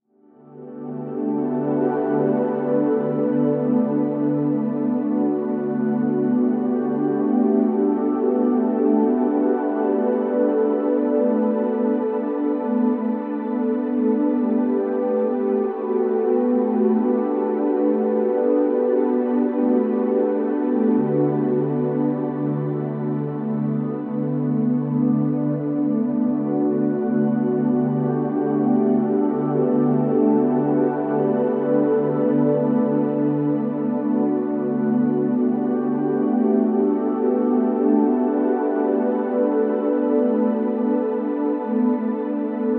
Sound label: Soundscape